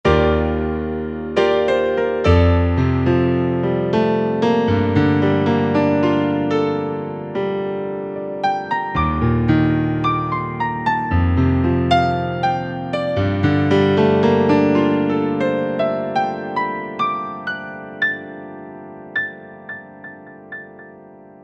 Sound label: L R Piano